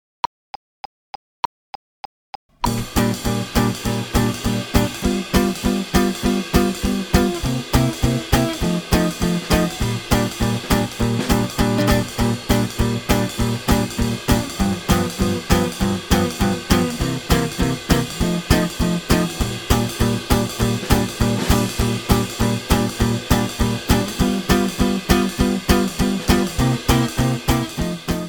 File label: swing